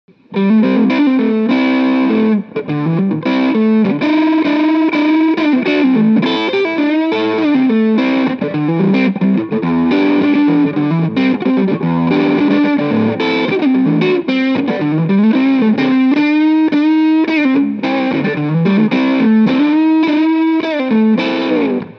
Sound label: Moderate Tight